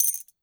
Coin Bag Ring Gemstone Item

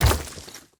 Breakable Wall Hit; gamerip

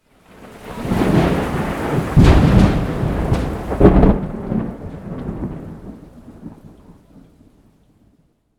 Thunder

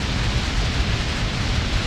eye fire loop; Nightmare Bg

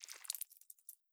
Blood

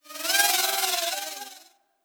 Drone Back

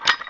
Ui Button; Knight Online

